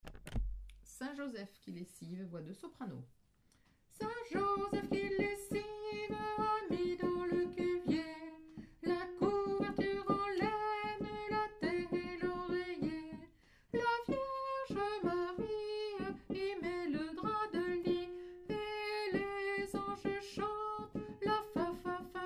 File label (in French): saint joseph soprano; nouveaux noels; chants noel